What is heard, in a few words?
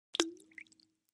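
Splash Small Plop Glass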